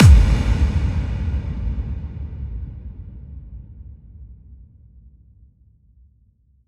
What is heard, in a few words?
Kick FX